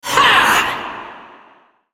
spellcast effort; Syndra

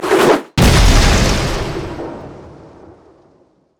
tidal wave; nami base

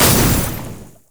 explosive cask; LoL; classygragas

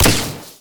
explosive shot; rocket tristana

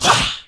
spectral basic attack; LoL; yorick

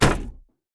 yeti vs wood; nunu

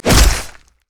basic attack knee; rengar

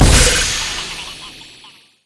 noxious trap; LoL; astronautteemo

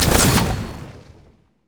bowmaster volley; LoL; ashe

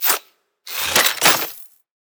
emote recall; LoL; thresh; undersea

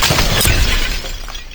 detonate; LoL; varus skin03

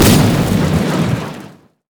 boom; valkyrie; LoL; corki

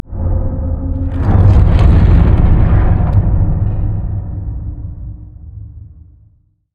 nexus powerup move; LoL

